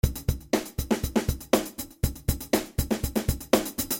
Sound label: 16beat